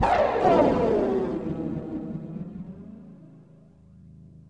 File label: AMBNT 160; eJay; Ambient